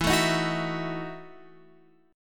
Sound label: E Major 7th Suspended 2nd Suspended 4th E, Guitar, Open F; x,11,9,10,9,11; strummed